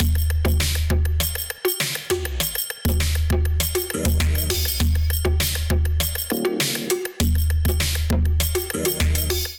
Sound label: Proto Clash Kit Melodic 100 bpm, Drums; MIDI Clips; Drive and Glow; Ableton; Factory Packs